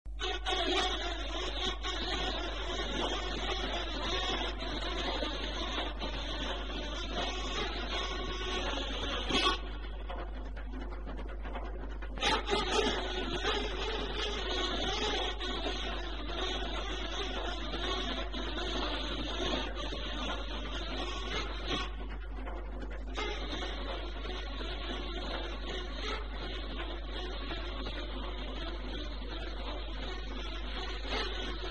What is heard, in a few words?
Chaharmezrab Ney; farstarane